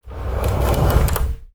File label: close door; Knights-Diamond